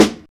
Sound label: studio snare g sharp key, snare sample tuned to the G# note. Loudest frequency: 1142Hz; snare drum samples; one shots